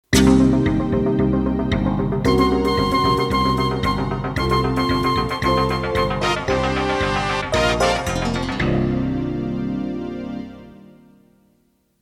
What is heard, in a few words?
Bulletin jingle